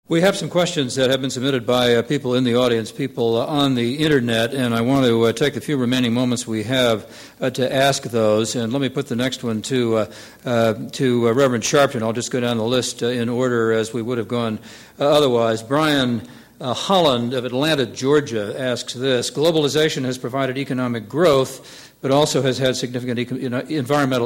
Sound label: dem forum audience